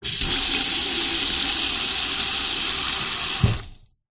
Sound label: household utility room sink fill plastic bucket with water short sequence